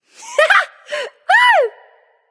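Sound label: yay, female; smite; voicepack; hera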